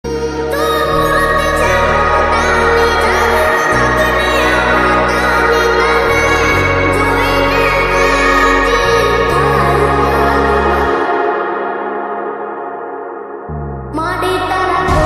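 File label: gujarati ringtone, lofi ringtone; chamunda maa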